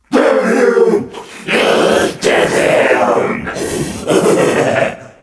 Zom CHEER; TrueCrimeStreetsOfLA; XBOX

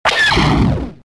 tie fire, weapons; tie fighter

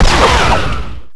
radio mast cable break; Max Payne; penthouse

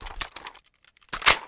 Rifle Reload, Sniper Rifle

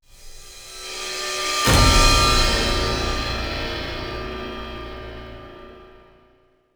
Sting

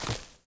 med creature fs dirt, footsteps

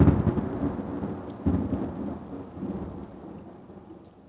thunder; Soultree

